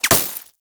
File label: Laser Impact Glass Large; Warframe; Bullet Impacts